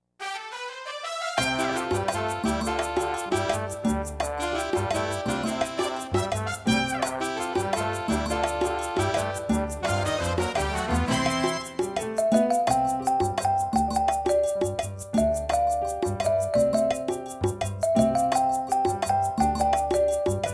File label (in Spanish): Los Quehaceres (No Vocals)